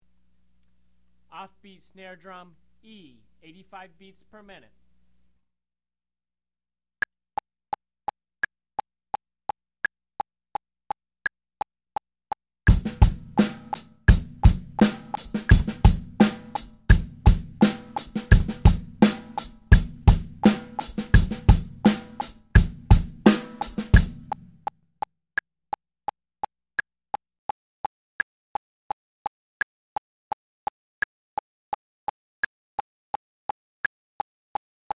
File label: off beat snare drum; Drum Lessons